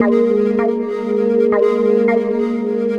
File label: Floater, Synth Sequences